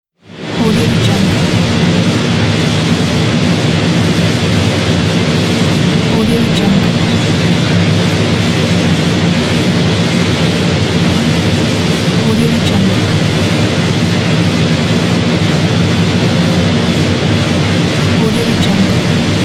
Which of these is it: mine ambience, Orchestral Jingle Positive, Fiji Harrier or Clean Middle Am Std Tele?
mine ambience